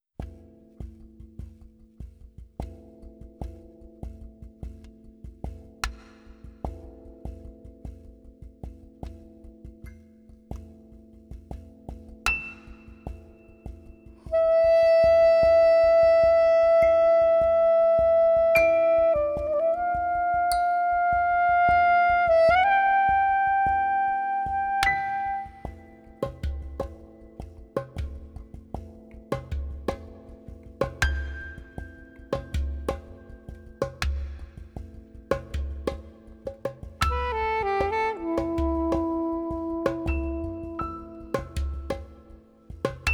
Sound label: Piano, Kalimba, Shaker